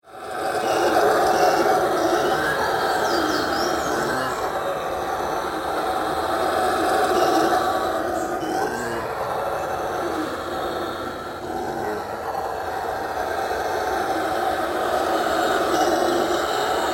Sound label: Alouatta fusca howler monkey; PE Cant; SP; Silveira